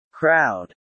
crowd

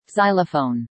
xylophone

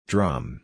drum

